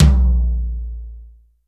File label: DRY T4, drum, tom